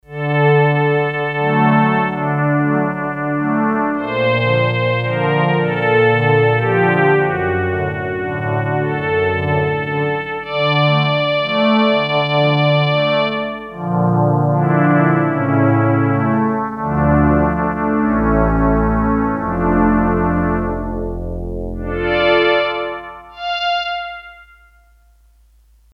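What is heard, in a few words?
Warm Strings, Korg DS-8; Factory Patches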